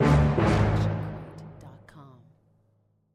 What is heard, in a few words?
low staccato; soundscrate